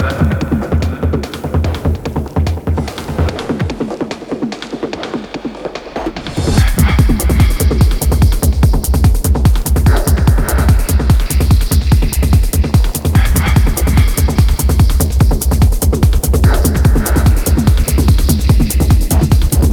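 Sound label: 146BPM Dmin, groove techno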